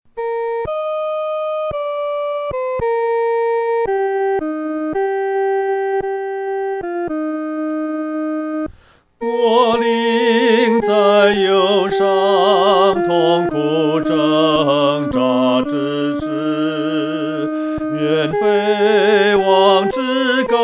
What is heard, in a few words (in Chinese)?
独唱（第一声）